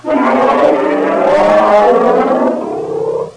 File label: Caged bear roar long